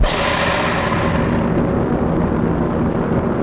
Glitch

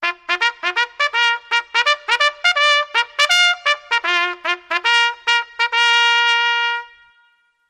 Assembly, Solo Bugle Call Reverb

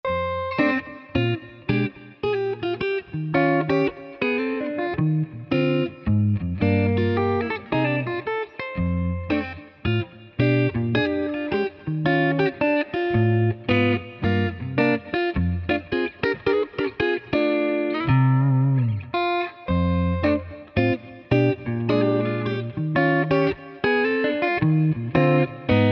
Dirt Road Shuffle

